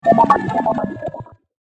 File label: Sci Fi Falling Decay; Science Fiction Sound Effects; star wars / trek style